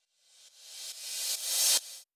138 BPM ReCrash; Sound FX; Bminor

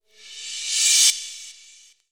Cymbals Reverse Crash; Vengeance Samples

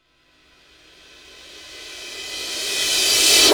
Sweeps Medium

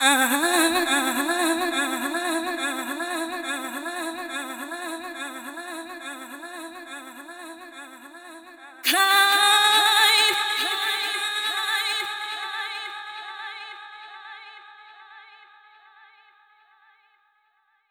Vocal 140BPM; D minor; Unlooped; Vengeance Samples

